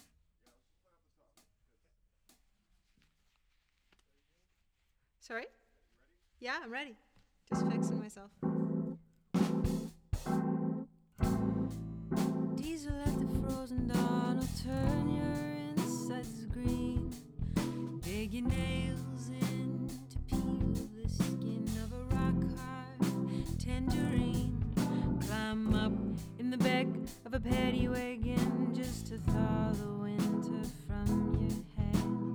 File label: Rough Mix